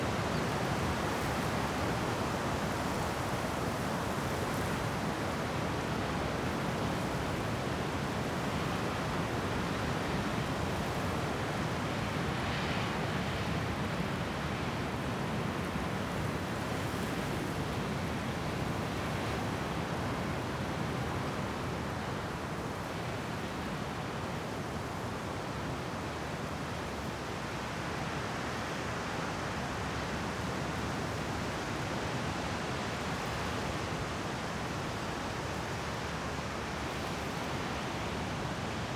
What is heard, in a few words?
Sea; Free Fantasy SFX Pack By TomMusic; BGS Loops